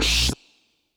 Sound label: OH Gong Tone Verb, Open Hat; Lost Tapes From Mars